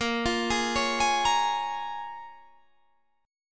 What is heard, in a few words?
Bb Minor 7th, Guitar, Drop D; 20,20,18,18,21,18